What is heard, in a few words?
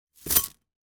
toy slinky metal down on floor